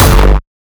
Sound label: kick oneshot monster F; GhostHack